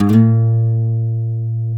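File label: B 2A MARTIN SLIDE, Guitars; MARTIN 6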